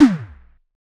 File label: Tom Metatom Mid; Drums